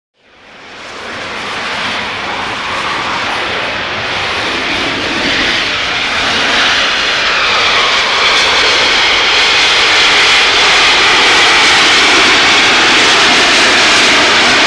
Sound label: Jet Engine Idle Harri, av-8b harrier